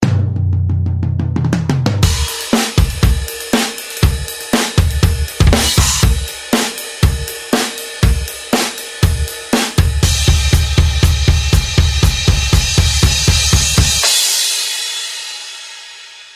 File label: rock drum solo